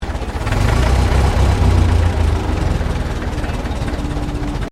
Truck Chevrolet 1926 30cwt Accel; Classic comercial vehicles; Trucks

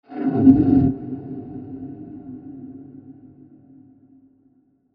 Creepy Horror Transition With Long Reverb; Scary Soundscapes; Crafted Signals

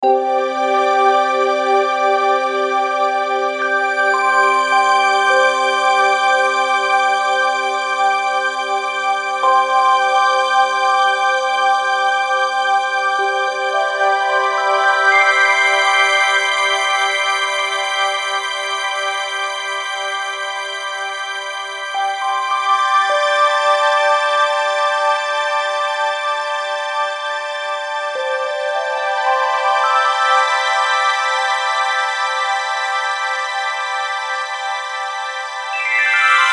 Bed Bells Pad; Bed Sound Effects; Blastwave; Production Element Imaging Element Accent Transition

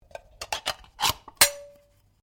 Can Ring Pull Remove Lid; various metal cans; Materials

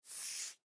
Chalk Sidewalk Draw On Cement; Short Stroke; Writing; Foley; Blastwave

